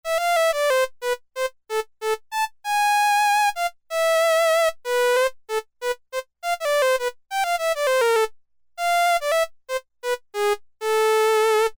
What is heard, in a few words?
viola, Korg M-500 micro-preset